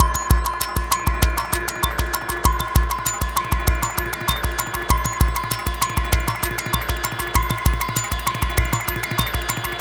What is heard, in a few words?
EUROPA GROOVE; FRENETIC FRACTAL GROOVES